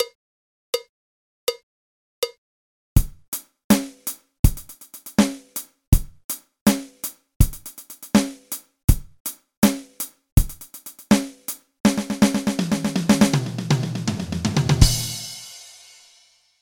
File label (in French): Exemple sextolet croche rythme